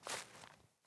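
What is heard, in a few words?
Boot Walk Vegetation, Footsteps; Characters; Enemy; Boots; Sinecure; Melee Grunt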